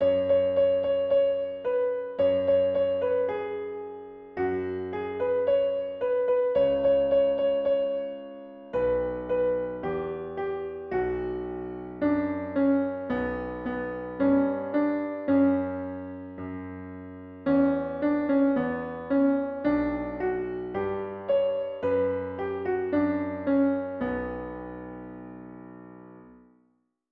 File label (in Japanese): ピアノ